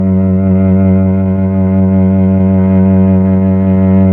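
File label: Mellotron Cello F#3, Slow Cello; Keyboards of The 60's and 70's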